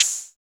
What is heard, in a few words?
SAND, Drums; Distored Reality 1&2